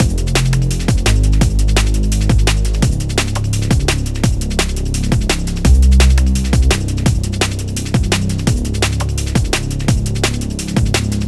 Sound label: drums bass